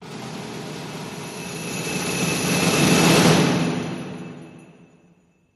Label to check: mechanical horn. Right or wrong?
Wrong